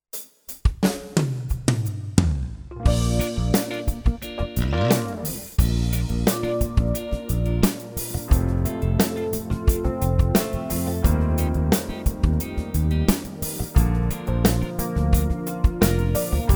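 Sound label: Ketron SD 90 Style Urban Jazz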